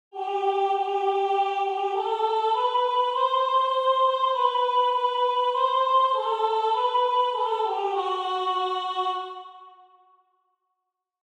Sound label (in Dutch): Sopraan; Laudate Nomen Domini